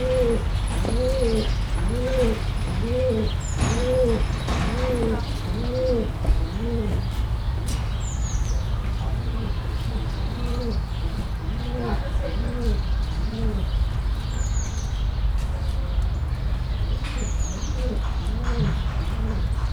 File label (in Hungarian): hosszabban, debreceni pergőgalamb; debreceni zoo 2019